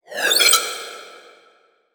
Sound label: Multimedia Internet CD-Rom Flash Wipe